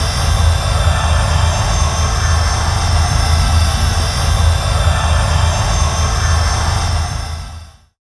light attack loop

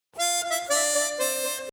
Tutti Intro; Outdoors Bastion

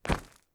Impact Gravel Light

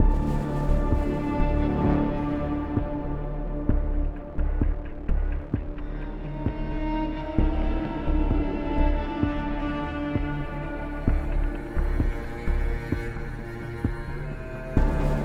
Cutscene Ambient; Deus Ex Mankind Divided

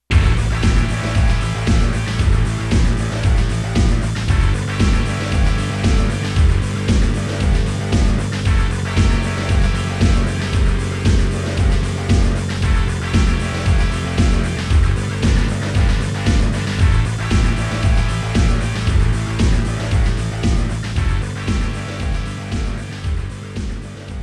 KO MUSIC; buriki one world grapple tournament 99 in tokyo

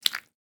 Splat

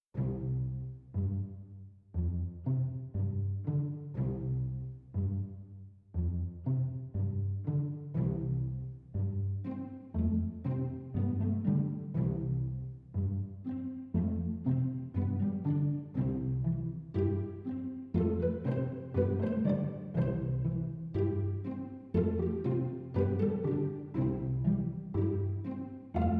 Outdoors; Dream Daddy: A Dad Dating Simulator

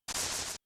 bush die; Idle Champions of the Forgotten Realms

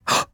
Emote Male Shocked; Idle Champions of the Forgotten Realms

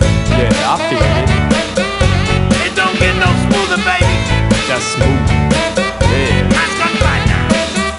Cowbell; Happy Feet Two; NDS

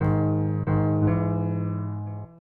Piano (Hand); Clock Tower: The First Fear

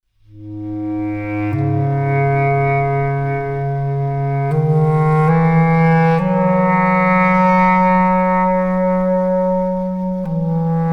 Clar Solo; Battlefield 1; Title Cards Ep05

